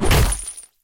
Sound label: Chain Bat Hit; Scrap Weapons; Zomboid